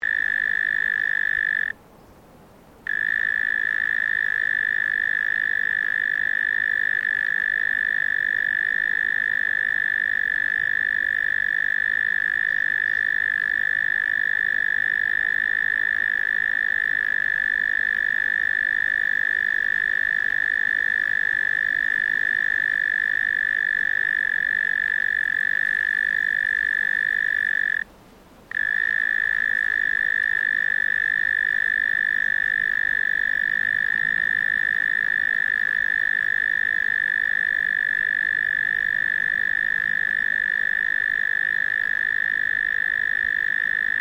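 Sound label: Insect Australian Cricket; Various Australian insects; Crickets; Animals:Insects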